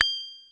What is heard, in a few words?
Bell, Korg T3; Drum Samples